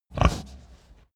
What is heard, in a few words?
pig grunt breath